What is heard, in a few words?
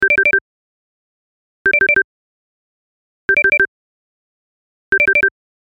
ringtone